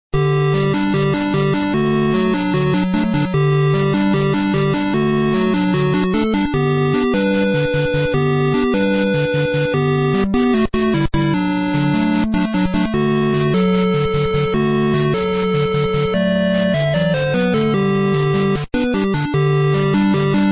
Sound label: la cucaracha